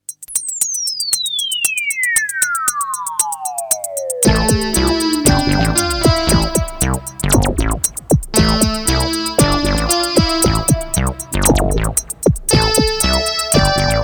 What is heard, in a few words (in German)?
Opus 3 und Metal Beat CR 78